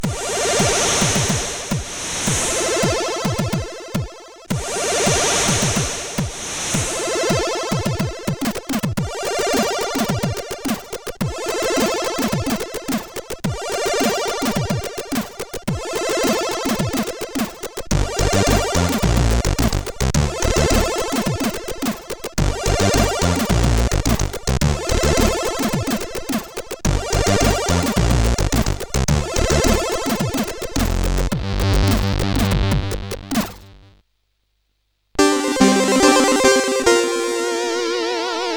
Shuffle, CSG8580R5; hvsc

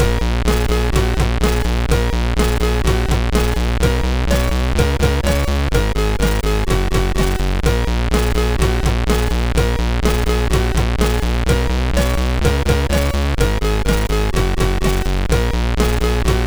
Xmas Rock, sid MOS6581R3

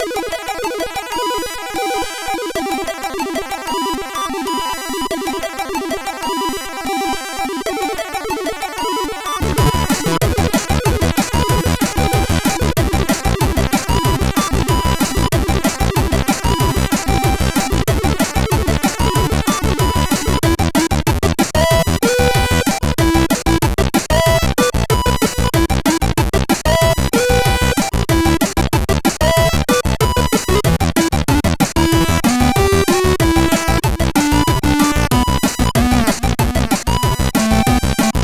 sid CSG8580; surrender; hvsc

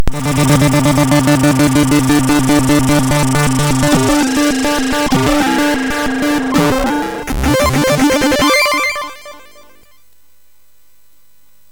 sfx, MOS 6581; hvsc

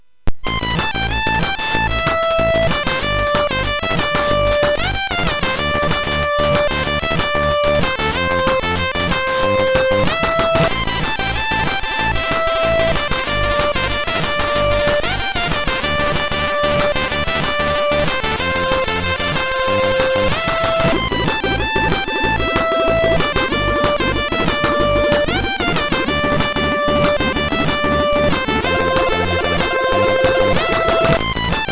Fast Melody, sid MOS6581R3; hvsc

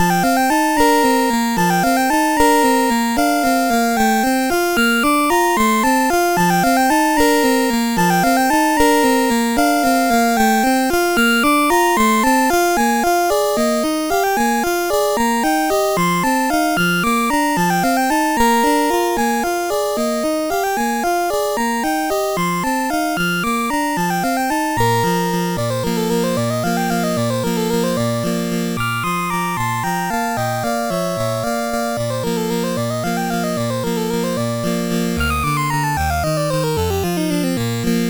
Waltz, CSG8580R5; Joseph A Grau

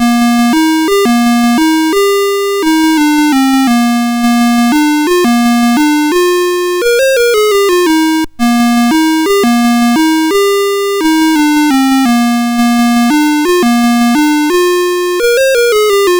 La Cucaracha, MOS6581R4; Music Shop

